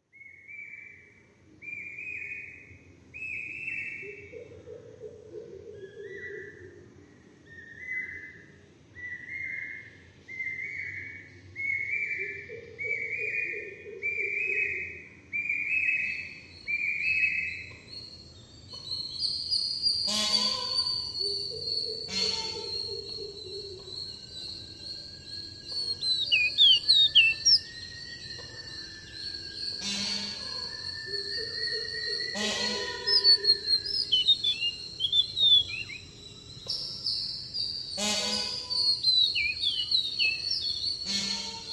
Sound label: birds and other stuff sounds; green acres